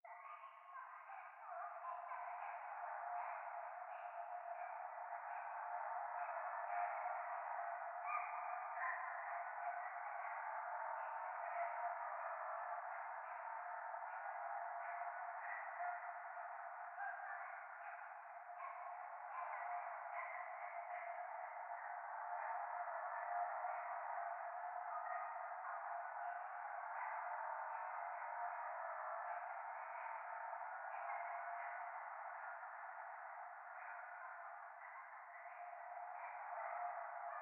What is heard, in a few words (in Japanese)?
廃墟 可愛い喘ぎ 車の中